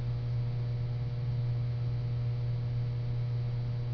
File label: fluorescent